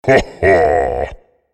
laugh; Malphite

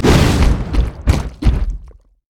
body slam; LoL; gragas

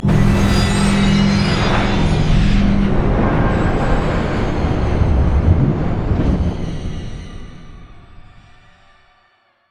bog crystal; odin

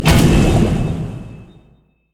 tidal wave; nami koi; LoL

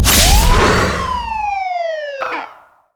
overdrive; LoL; blitzcrank skin08

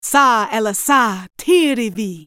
ultimate effort; Karma; Character Voices - English

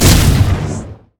thunder strike; LoL; leesin; blindmonk

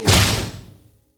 basic attack; lucian base

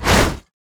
basic attack dragon; LoL; shyvana

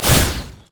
fracture; LoL; skarner

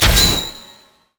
dash spin; LoL; vi racer

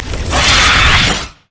spider smash; LoL

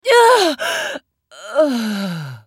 dying; LeBlanc

